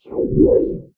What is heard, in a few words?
portalgun error; minetest